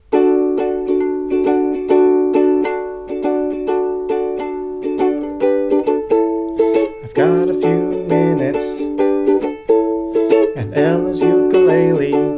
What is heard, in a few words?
impromptu in d major